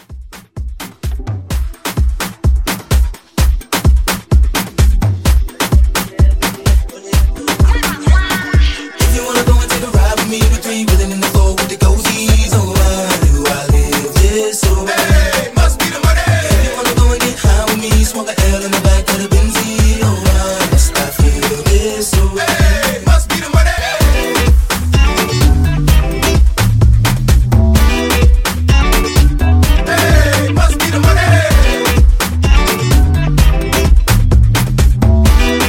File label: Afrobeat Re Drum 128